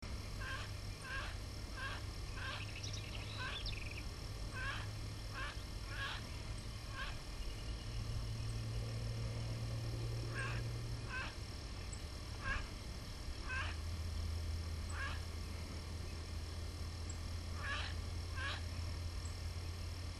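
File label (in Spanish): pato capuchino, Anas versicolor, Silver Teal; cantos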